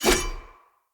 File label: Sword Whoosh; Weapons; Swords; Fenn Jotar Sword